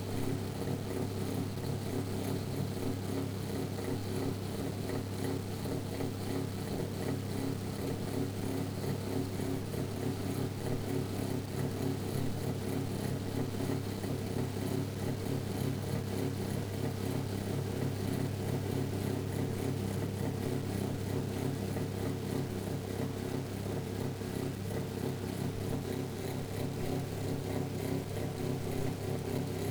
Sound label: old engine